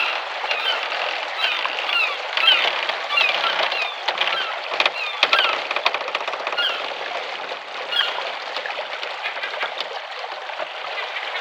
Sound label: wheel Ambient loop